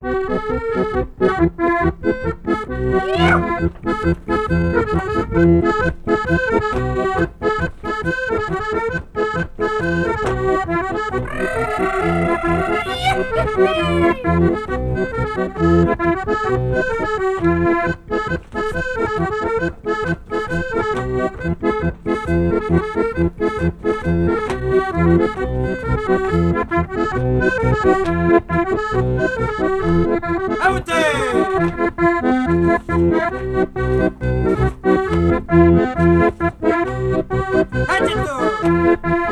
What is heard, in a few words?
alto